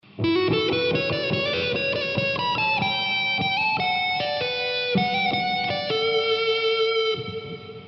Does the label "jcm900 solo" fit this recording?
Yes